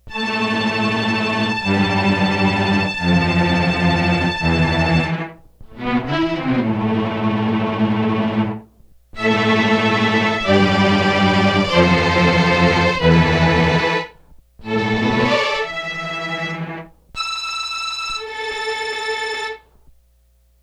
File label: STRINGS TREMOLO